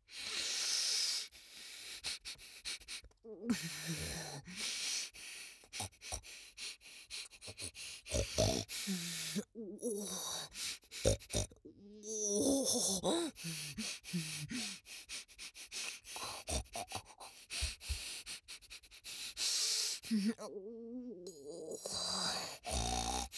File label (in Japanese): 発情豚嗅ぎ; 真面目系OL